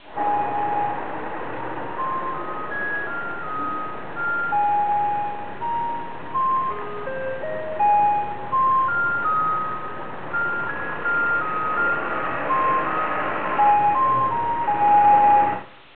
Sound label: truck